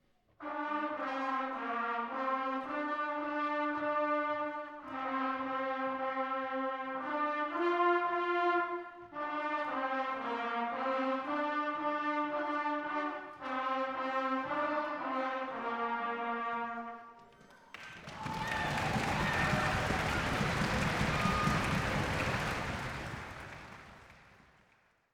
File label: Trumpets